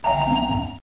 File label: SUB PING